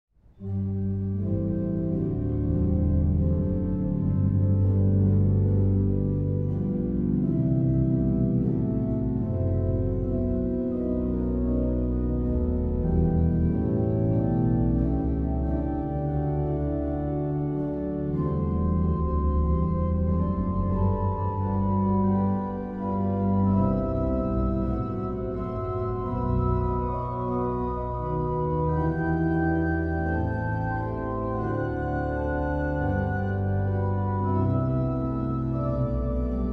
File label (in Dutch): Psalm 108 Improvisatie (hoofdorgel en trompet)